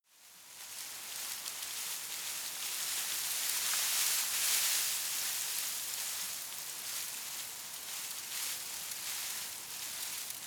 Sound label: shrub tiny leaves rustle soft; haus-garten